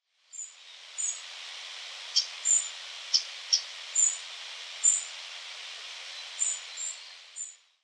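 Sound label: Asian Stubtail, nara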